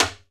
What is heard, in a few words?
MUTED RIM, Timbale; Timbale Battery